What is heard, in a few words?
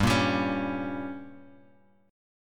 G Diminished, Guitar, Open F, chord; strummed; 7,5,7,8,x,x; JGuitar